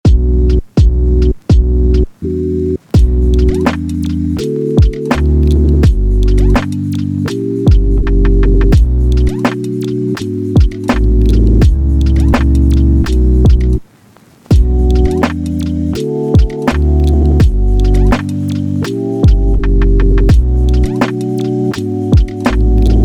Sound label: drum kit; l dre drum kit vol 4